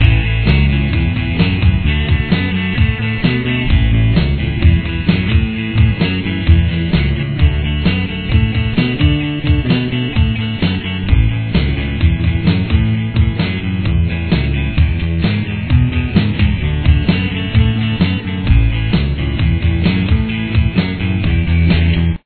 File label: blues rock in A